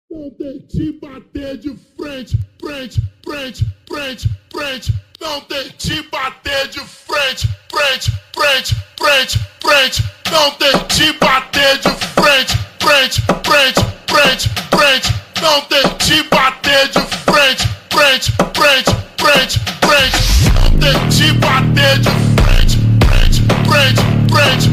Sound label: slowed reverb